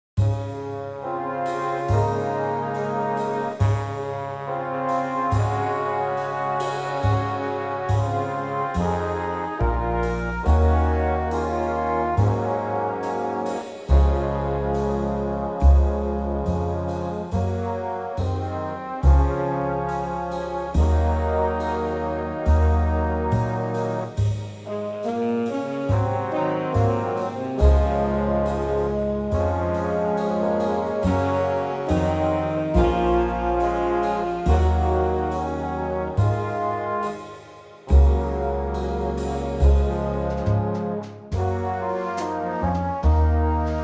Db Big Band

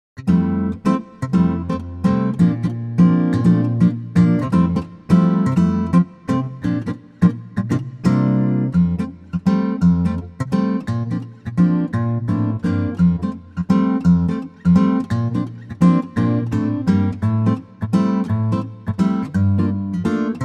3/4 F acoustic guitar

